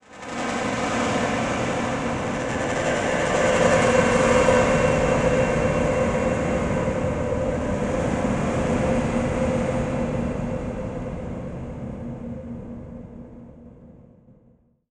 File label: Title Transition Stab Dark Long; Production Element